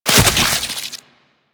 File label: Coolant Turret Fire; Warframe; Corpus; Arachnoid; Abilities